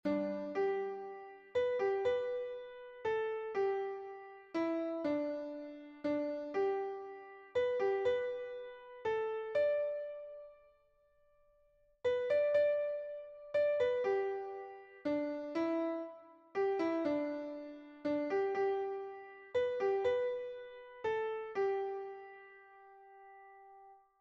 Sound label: Amazing Grace Piano